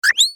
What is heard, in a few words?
Bird Notification Sound